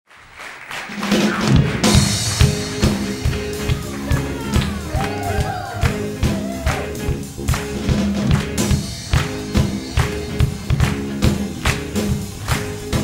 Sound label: Reggae